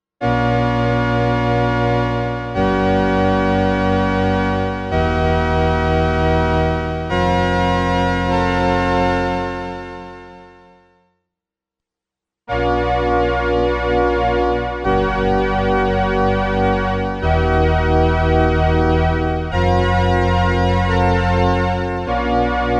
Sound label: Stereo Phasing